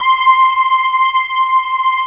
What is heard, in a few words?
JAZZY C5